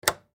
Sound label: Radio Flip Switch Up Antique; Technology; Miscellaneous Electronic; Blastwave